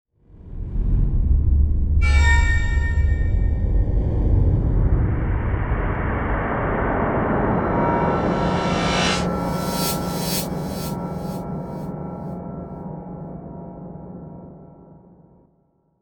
Electronic Whoosh Digital Low Frequency Rumble Rev; Reverse Noise; Module Production Element Title Imaging